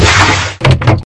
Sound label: ship exit